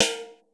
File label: house open; Crypton - DrumBase5000; HOUSE #1